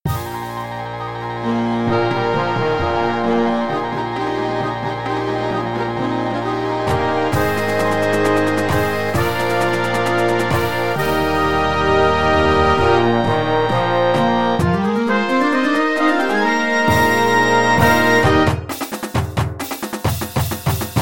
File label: jingle bells - parade tune